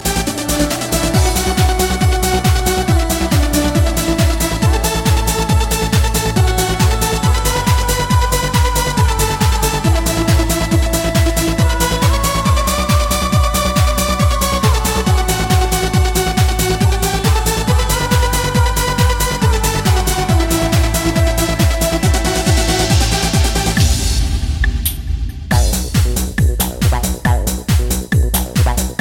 Techno

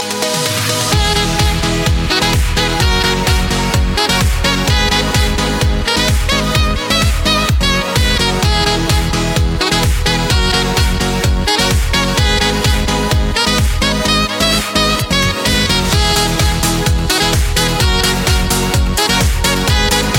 New Dance Sax

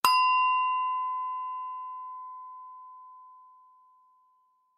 Tibetan Bell

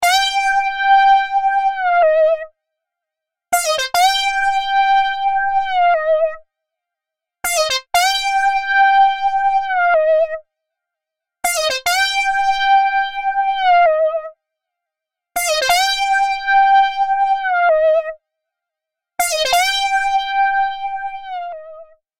Funk

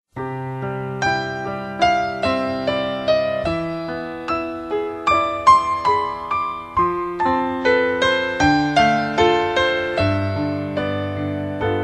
Sad Song

